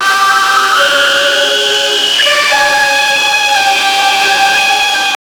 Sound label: synth